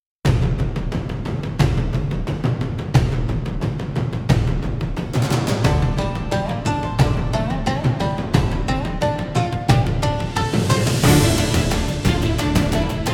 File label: Thunder Drum Chase, cinematic japanese style battles